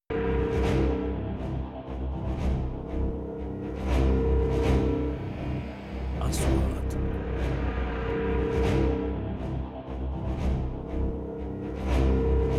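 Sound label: 3D SFX Trailer; مؤثرات تشويقية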